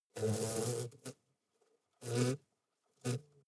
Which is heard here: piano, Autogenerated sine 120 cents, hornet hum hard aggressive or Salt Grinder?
hornet hum hard aggressive